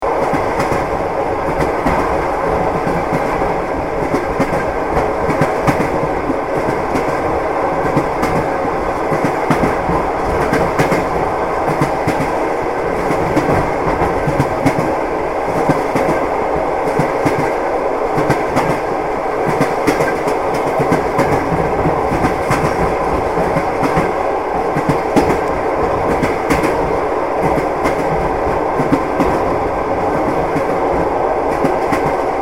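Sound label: train wheels clatter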